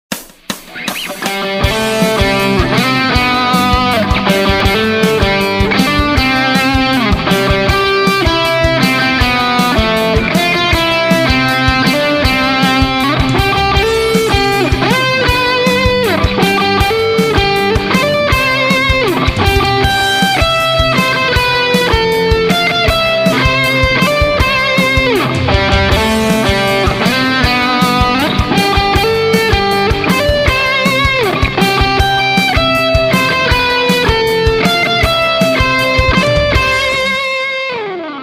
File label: happy birthday rock